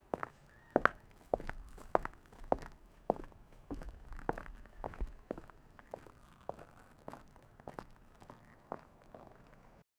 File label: footsteps on concrete